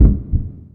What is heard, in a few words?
Heart; Ib Remake